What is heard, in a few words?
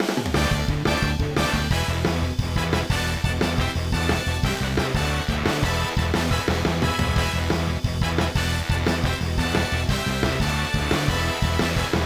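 Golf - Birdie; mario sports superstars 2017 3ds gamerip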